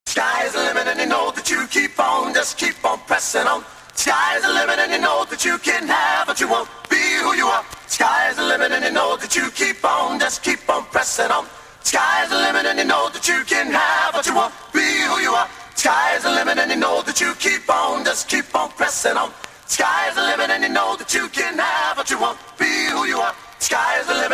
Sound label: Acapella